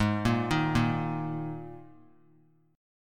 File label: G sharp Suspended 2nd, G sharp, down; G#sus2; arpeggiated; JGuitar